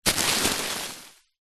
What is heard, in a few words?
plastic bag bin liner full of soft clothes or pillows push pressure apply; zapsplat; foley